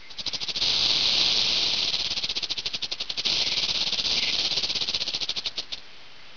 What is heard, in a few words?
snake, animal; ambient; Source Engine; cstrike